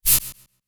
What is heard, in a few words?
Air swish short weird